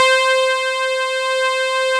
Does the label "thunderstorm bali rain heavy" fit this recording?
No